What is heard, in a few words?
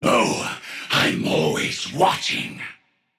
ward placed; bakasura